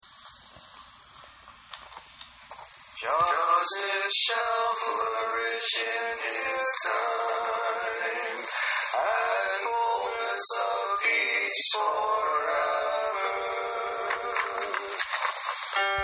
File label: practice Psalm tone